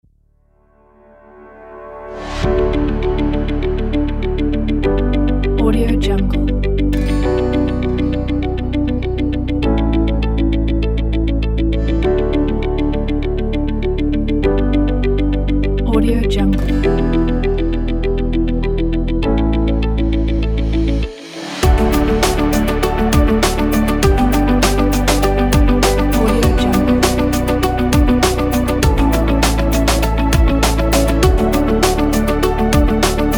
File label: inspiring ambient hip hop corporate kit; stereonuts